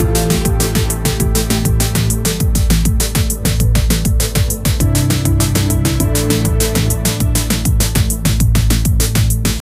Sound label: lifestyle montage chill e